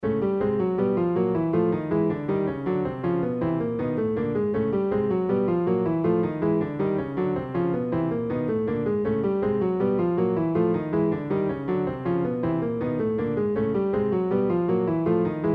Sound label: Strange down complex